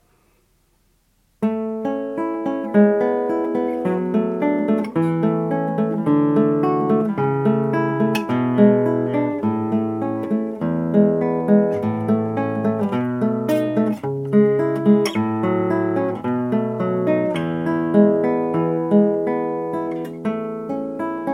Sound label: Justintonation Guitar; Sor; op35-9; A-dur